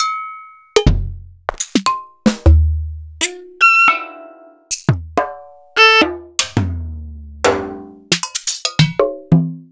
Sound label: EMU Planet Earth